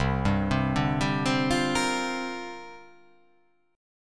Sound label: C 9th Suspended 4th C down